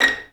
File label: VI2PIZZ#A5 L, VI PIZ; Best Service; Peter Siedlaczek's Advanced Orchestra